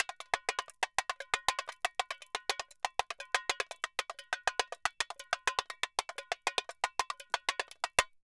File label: Tamborin Samba 120, Drums & Percussion; Best Service; Festa Latina VOL-1